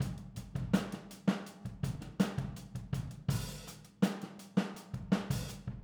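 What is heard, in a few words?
Boogaloo, Overhead Stereo, 82 BPM; Drums; Verse; Sonic Foundry; Drums from the Big Room The Mixes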